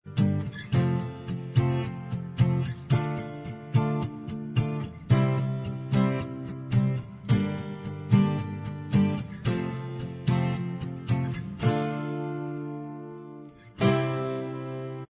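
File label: acoustic style instrumental track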